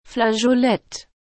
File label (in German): flageolett, ukulele